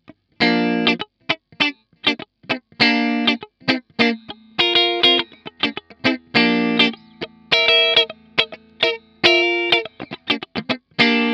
Maybach Teleman T61 Mitte clean

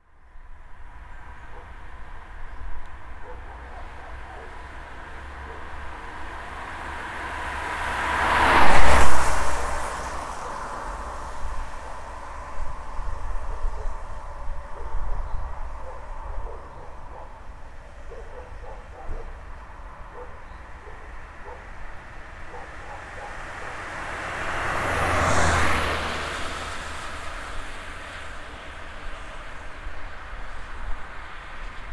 Vorbeifahrende Autos

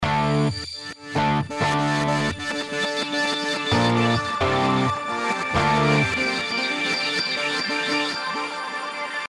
E-Gitarre Efx; FRAGMENTS

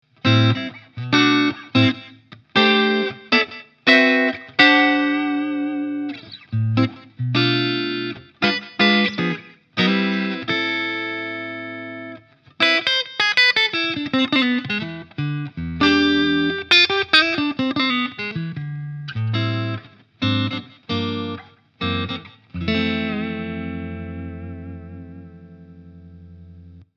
Halspickup clean

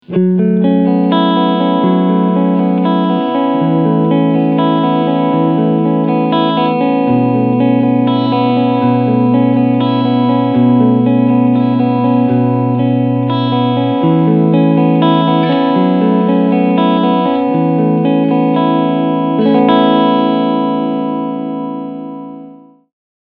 Clean beide PUs

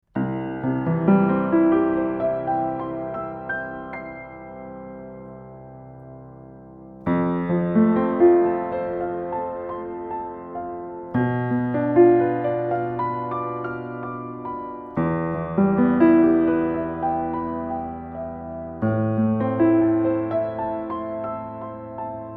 DPA 4060 Piano Kugel Metallrahmen Unten; LR Schoeps MK4

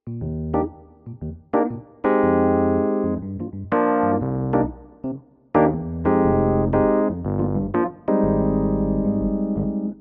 Clavia Nord Grand E-Piano

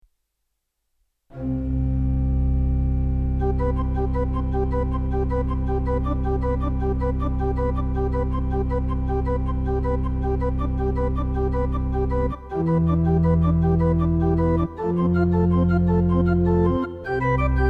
Orgel; YDP141

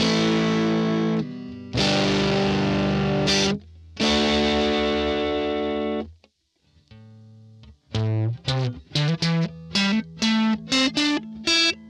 Git Crunch; Steinberg UR24C

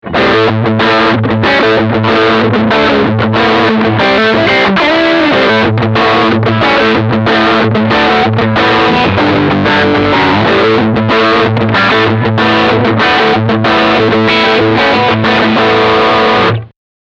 Riff Distortion beide Picku

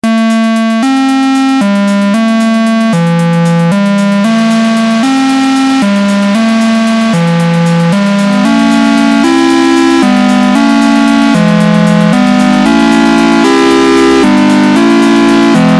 Minimoog Akkord Sequenz Noise

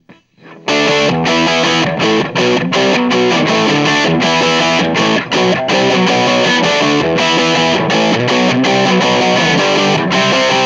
AC30 Mikrophon

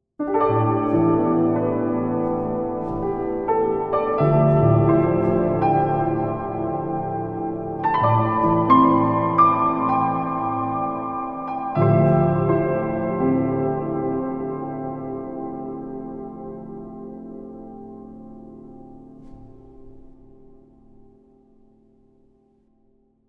Klavier Reverb; Oxford

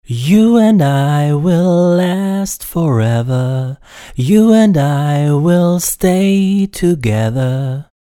Gesangsspur Tight And Loose

